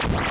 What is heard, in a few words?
sword slash lowbit down